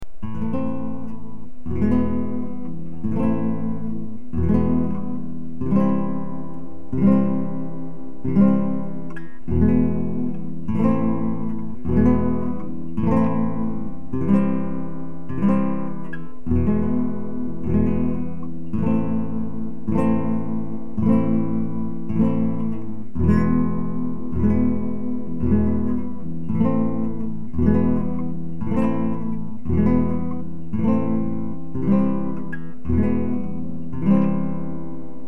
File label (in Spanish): ejercicio acordes, guitarra